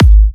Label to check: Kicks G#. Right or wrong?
Right